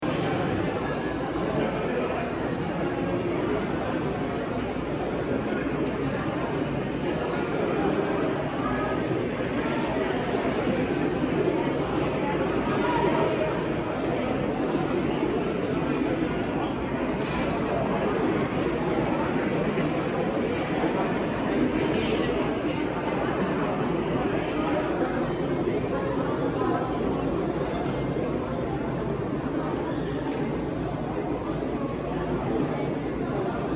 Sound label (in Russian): zvuk liudey v apple store; Разговоры, смех и восторг толпы людей